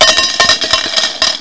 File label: many coin; DawnVideoPoker